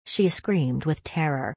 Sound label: She screamed with terror